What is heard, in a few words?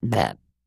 Cute Burp; burping